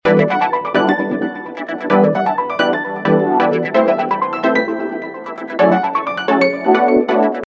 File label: J Pop Signature, ringtones; TCL M2L